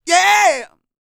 R ROCK, MALE HUMAN; R&B & Dance; ILIO; Vocal Planet

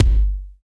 BD, Dance; Sound & Vision; Gigapack I CD 1

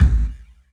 LEDRMK, 3D Drums, LESLIE KICK

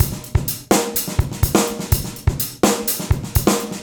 125, Beat Loops; Carnage; Zero-G - Monster Beats

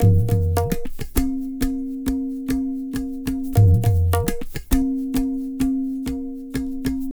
tabla, 135 bpm; Zero-G; Pure Tabla

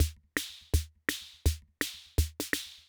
Loop, rhythm king; classic machines

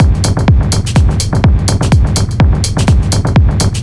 125 drum loops; Boomtown; M8; Electronisounds

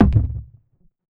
KICK ELECTRO; JUNGLE BEATS; ELECTRO KIT

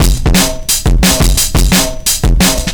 175, Breaks; Drum&Bass; EZ Rollers

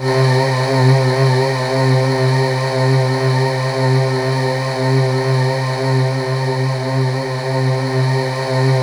C3, Synth Leads

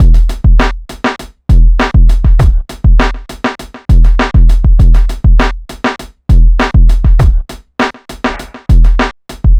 pure groove 100bpm

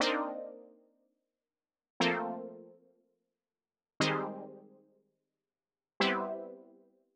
120 Stabs A, Keys, Nu Jazz House; PowerFX

